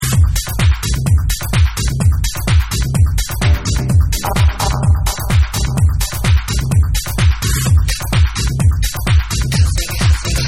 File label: Cosmic Dub